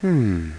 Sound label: hmm; robotron